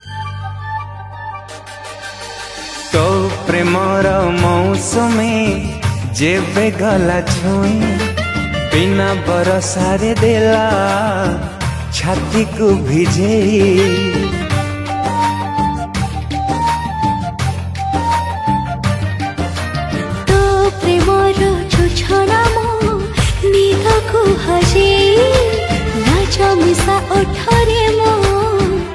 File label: Odia Song